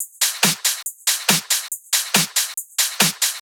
Loops 140 BPM Hihat Percussion Loops

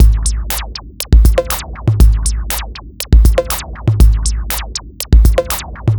Drums Strange; Sonic Foundry (Sony Creative Software); Machine Language

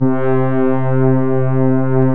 FM Elmo Pad; 90s Sample CDs; Club-50 - Foundations Roland; FM Syns

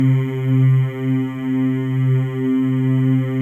MALE MMS, Symphony of Voices; Spectrasonics

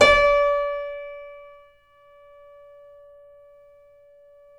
C7 FF, The Ultimate Piano Collection; East West

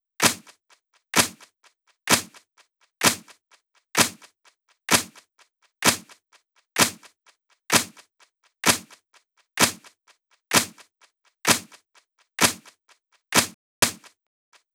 Loop 128BPM Clap; VEC5 Drumloops; VEC5 Multi Loops 128BPM; Loop Elements

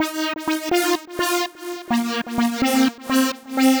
String, 126bpm, Nu Dance Electro; Zero-G - Soundclash; Frankfurt Zwei

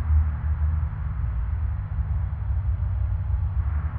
sparse soundscape samples; ReLooped

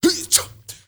MALE RAVE, MALE NOISE, Heart of Africa; Spectrasonics; Heart of Africa 1

